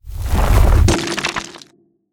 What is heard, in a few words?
cast earth; casting